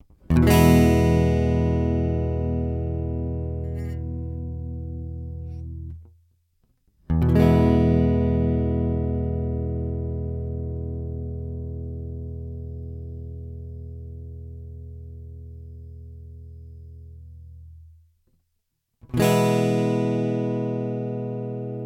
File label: fast track Les Paul Chords